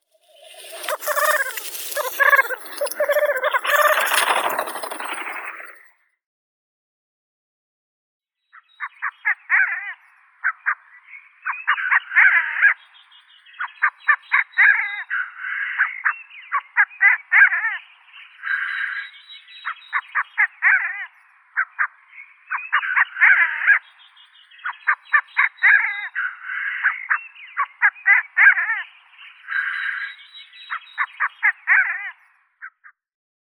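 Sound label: black grouse Tetrao tetrix, nature sounds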